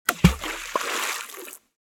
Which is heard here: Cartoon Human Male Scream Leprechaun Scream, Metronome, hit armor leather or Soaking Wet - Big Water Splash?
Soaking Wet - Big Water Splash